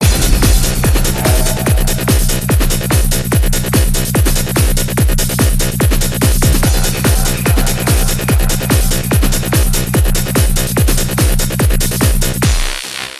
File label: Psycho Trance